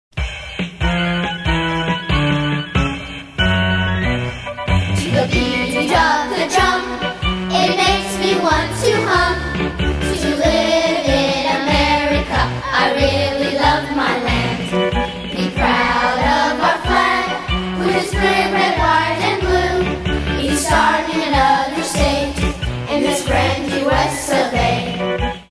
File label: Beat of the Drum